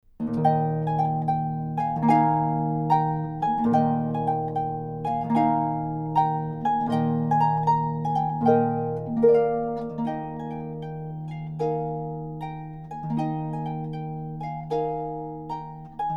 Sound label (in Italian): Allegro Grazioso; Spring; Opus 8